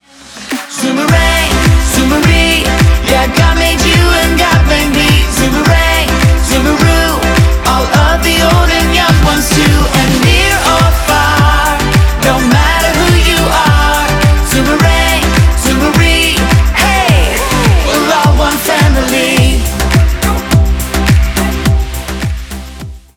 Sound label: theme music; zoomerang